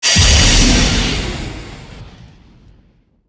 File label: grenade explode